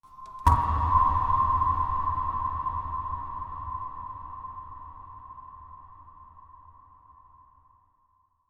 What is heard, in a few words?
ring modulation, fx